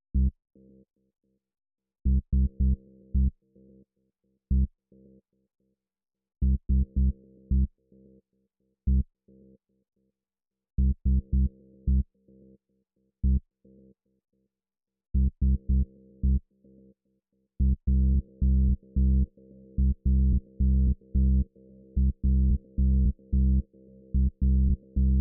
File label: Bass